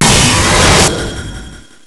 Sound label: Time stop noise